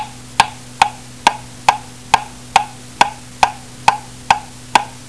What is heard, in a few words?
piccolo